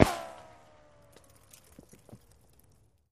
Bullet Ricochet Sin

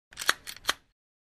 Clip Remove Gun

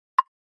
Flash Gear Hollow Met, flash gear

